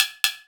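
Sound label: snare, EMU Orbit-3 drum machine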